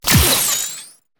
scifi launch

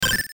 8bit activate

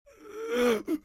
zombie death; LegendsOfCoyoteGulch

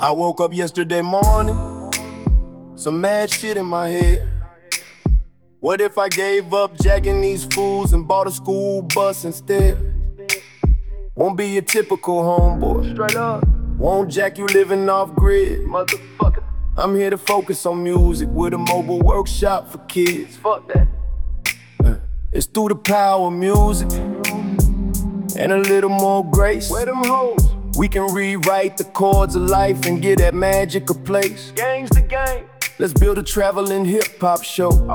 urban trap theme; monsterplex

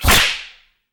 venom apply; tyrants edge

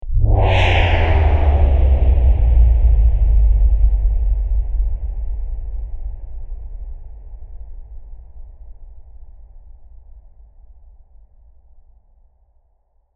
lightning Vortex; LegendsOfCoyoteGulch

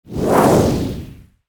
fireball; Legends Of Coyote Gulch

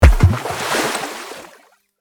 water sink

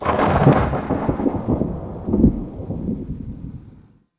thunder; orbit

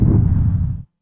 missle launch; vega

